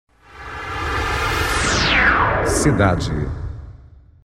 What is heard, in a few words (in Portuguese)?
Vinheta; Cidade FM